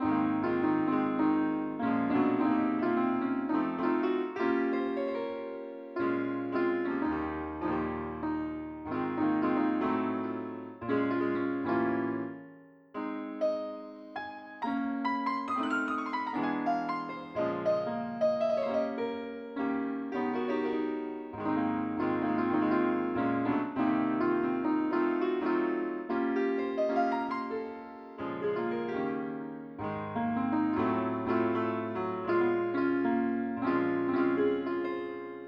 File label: piano